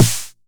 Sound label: 909 SD; Goldbaby Tape808 & Tape909; The Tape 909